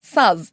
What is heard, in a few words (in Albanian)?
saz